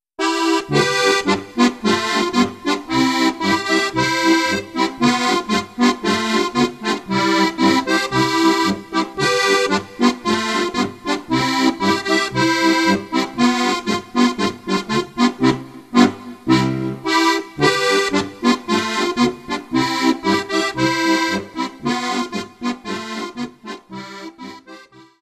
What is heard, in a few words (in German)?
polka